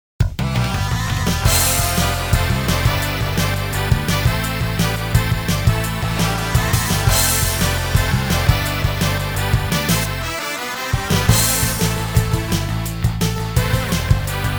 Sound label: overture (original karaoke version); Techromancer